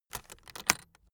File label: aluminium case open close